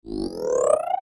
Line graph rising animation infographics

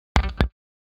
Electric guitar tap

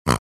Pig grunting

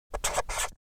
Handwriting signature paper contract

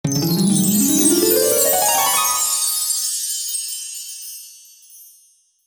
Fairy godmother arrival

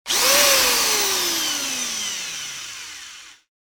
Electric drill drilling into the wall quick

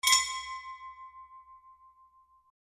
Traditional shop doorbell chime

